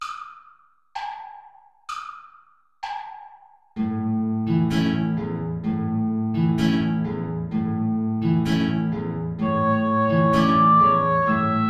tango play along without oboe; albeniz isaac

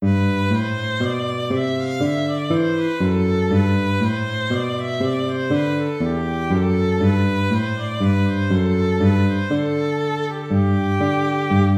baroque minuet major

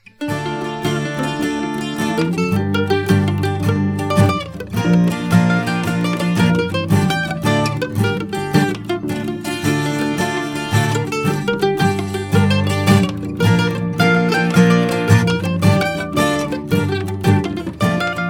jig

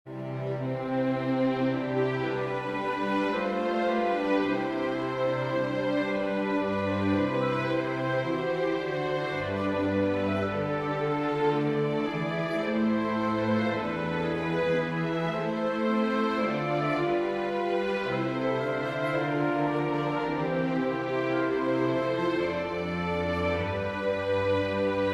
for winds strings; purcell henry; heart inditing good matter

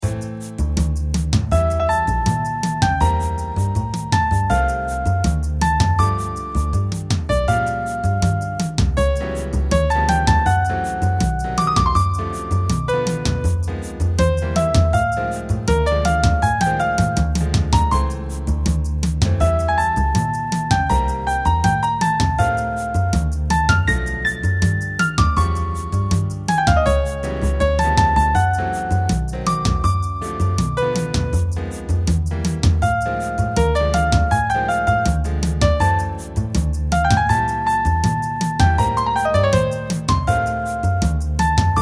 latin wave

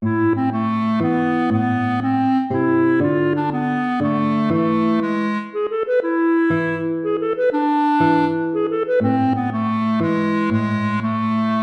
baroque minuet minor

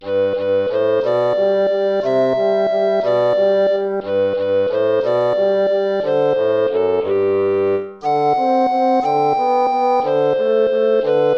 waltz; Carulli Ferdinando

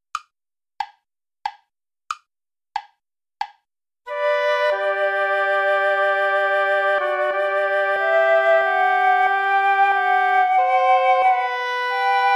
sarabande play along without guitar

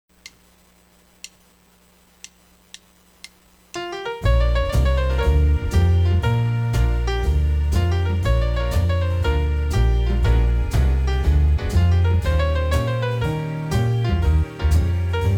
blues riff

